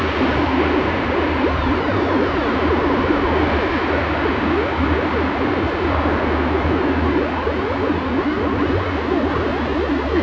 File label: Two space shuttles are fighting in the space